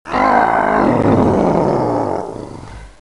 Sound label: zombi death heavy